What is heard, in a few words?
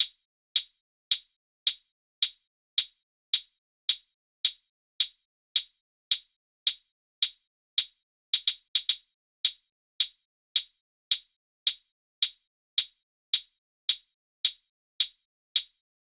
loop hat 108; Seismic Loops